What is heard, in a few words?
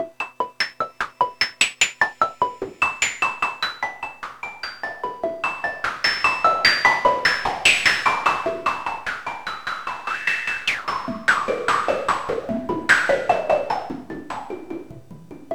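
sequence JP8; AMG; Now CD-ROM (Roland); Trance; G.T.M. SEQ 2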